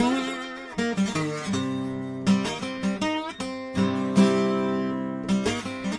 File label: country boy slide guitar; GUITAR LOOPS